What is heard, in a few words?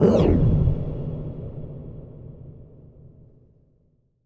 slowdown; Kung Pow